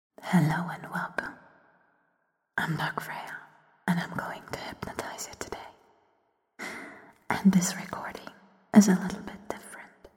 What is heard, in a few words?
ASMR